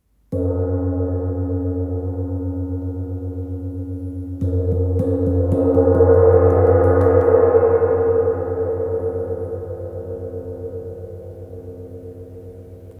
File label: Wind gong Fa diese 55cm